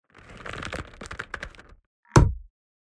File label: unleash arrow